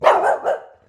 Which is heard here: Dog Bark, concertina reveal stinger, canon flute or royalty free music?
Dog Bark